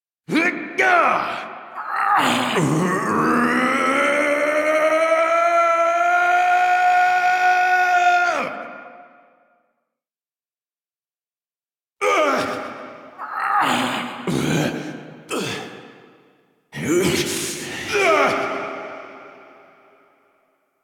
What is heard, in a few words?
Tank Finisher; Arthur; Warframe; NineteenNinetyNine; Cinematics